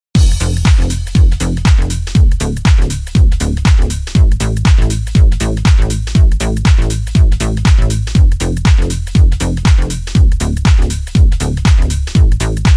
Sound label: Synth Bass